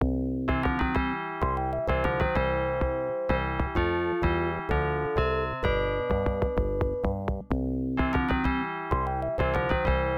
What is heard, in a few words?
Win Game; toki; arcade